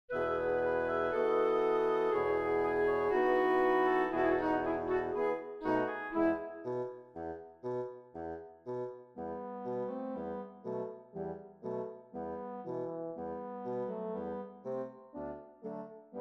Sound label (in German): tutti